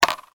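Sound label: coin drop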